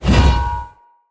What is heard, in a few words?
Whoosh, Swords; Mockery Sub Boss Stultion; Enemy; Weapons